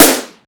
Electronic Snare; Yamaha PSR E305

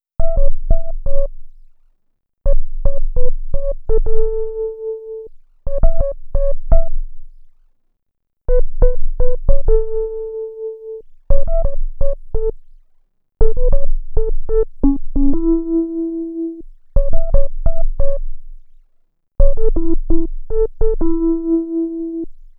Diddle Dee Fills, electro lounge; Sonic Foundry (Sony Creative Software)

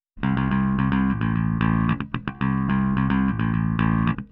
Bass, Indie Rock

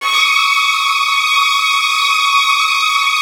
VN TRILLS, Virtuoso Strings; ILIO; Kirk Hunter Virtuoso Strings VOL-1

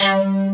CUTTER X BASS; Pro-Rec - Synthsonic; BASSES

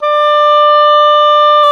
OBOE, mf f; Roland L-CDX-03 Disk 1; Oboe 2&3

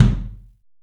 ROOM KICK; 3D Drums

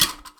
Finger, Prepared Kit; Sonic Foundry (Sony Creative Software); The Electronic Music Manuscript Collection; Battery 3 Sample Kits

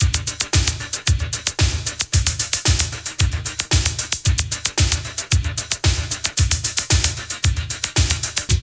LOOP, Tekno Industrial; East West

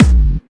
Clubby Kicks, Bassdrums

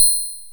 BELL; E-MU Formula 4000 Series Vol. 1; Hip Hop Nation

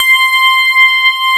CLAV, FUNK FM CLAV

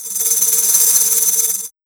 DRMS, Underground Hip Hop; USB Soundscan vol.02; AKAI

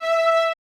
E4 L, Orchestra; AKAI Advance Orchestra Upgrade 97 Vol.1

